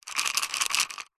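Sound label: household vitamin tablets in plastic pot lid off shaking fast; zapsplat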